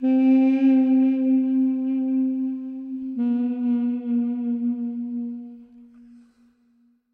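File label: sounds of a duduk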